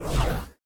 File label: flame Plasma; Mindustry